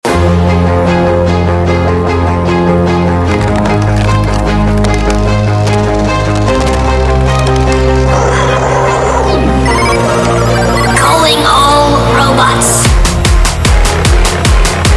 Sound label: Droid Bass Sounds; Unison Artist Series AXEN